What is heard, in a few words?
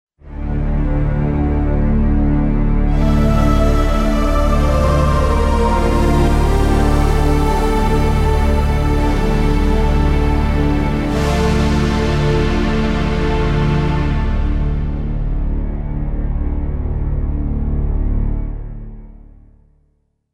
Game Over; Soukyugurentai